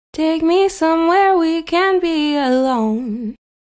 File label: backvocal in flstudio